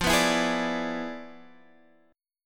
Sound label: E 7th F, guitar; E7/F; strummed